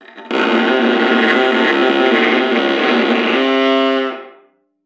KRATZ, VIOLA FINGER; Xsample VOL-5

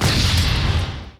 RAKETE; Best Service; Advanced Mega Effects

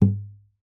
KLOPF, GUITAR FX; Xsample VOL-2

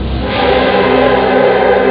oper; ST-XX Amiga Soundtracker Sample Packs

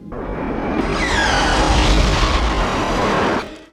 DUESEN; Best Service; Advanced Mega Effects

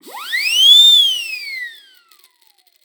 TROETE; Xsample VOL-9

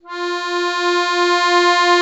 AKK VIOLISW, akkordeon

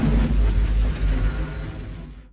RAUMSC; Best Service; Advanced Mega Effects

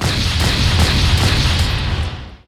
RAKETEN M; Best Service; Advanced Mega Effects

